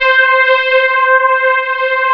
CMI ORGAN

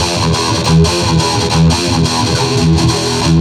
Treat Guit, Industrial Nasty

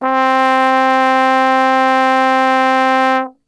C 2 Brass Super Section; Best Service; TRMBSTRGHT; 90 s Sample CDs

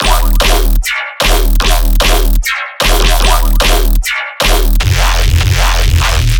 bass loop smashed 150 D#; Virtual Riot

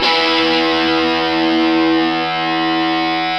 ROCK GUITAR

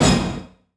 old skool stab

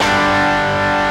DIST CRD G0, DIST GUIT

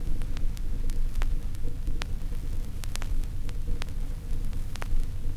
Crackle, Vinyl Noise

